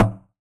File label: Vintage Percussion; Organism; Cymatics; Mystery Pack Vol 4